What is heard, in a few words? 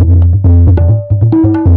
TABLAS; Zero-G; Chemical Beats